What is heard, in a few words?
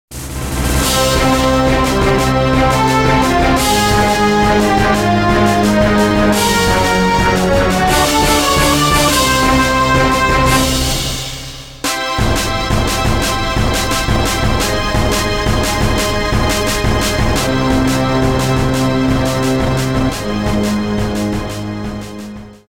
fanfare finish